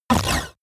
sci-fi laser shot short

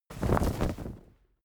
flag small flap

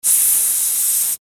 bathroom spray

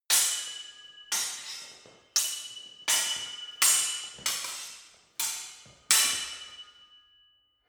sword hit sword sequence

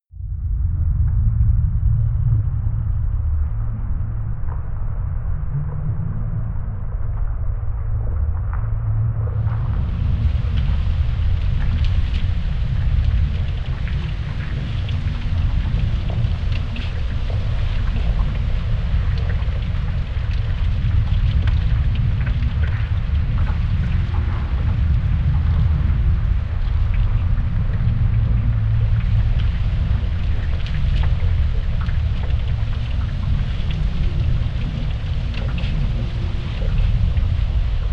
atmosphere grumbling water

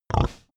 pig grunt

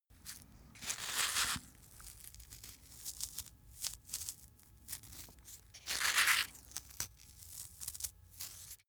small animal eat crunch

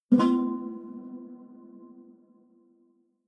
low string buzz dissonant high

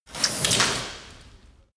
chain mail drop

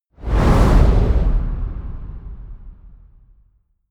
wipe

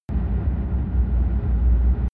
drone spaceship rumble hard

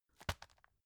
tissue package drop soft

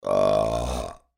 belching

